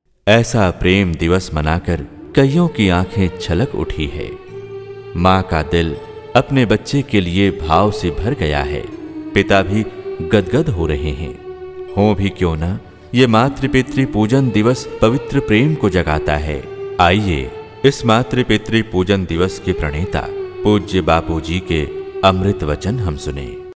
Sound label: Voice; मातृ पितृ पूजन दिवस; Poojan Vidhi